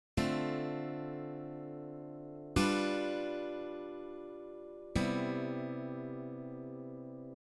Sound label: Dm7 G7 CMaj7, guitar